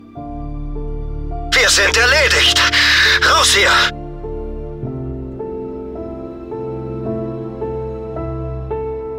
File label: lose; angriff offizier